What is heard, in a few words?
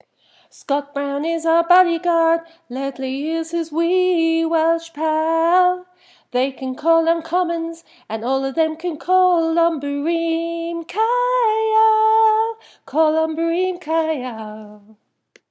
after fifteen attempts without laughing